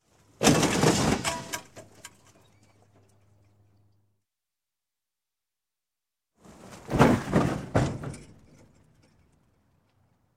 Crash, Auto Medium Side Swipe; Sound Ideas 2000 Series

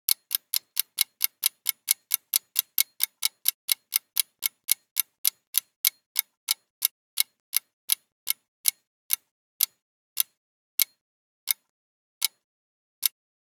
Clock Tick - Fast to Slow - Stop Working; Hollywood Edge; sound designer's toolkit v2

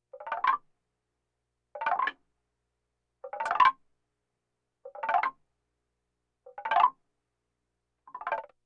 Percussion Bongos Tympani rolls Gongs Drums

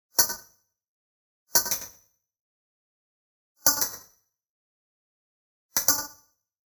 Arcade Click Neutral; boom library; The Interface

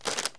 Paper Crinkle Rustle; SFX Kit; Foley - Misc

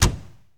Lamborghini Door Close, Automobiles

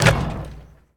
Street Ball Dunk Nylon Wood, Street Basketball; SFX Kit

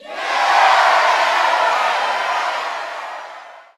Boxing Crowd Cheer; Crowds

